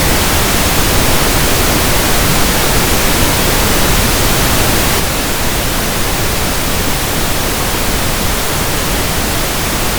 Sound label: pink noise diff 3dB